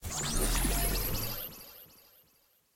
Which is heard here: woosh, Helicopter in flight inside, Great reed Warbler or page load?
page load